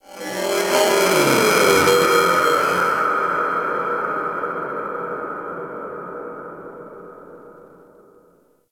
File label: Cephalon Tech Medium, Ambience